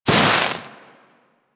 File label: Axe Projectile Impact G; Sundial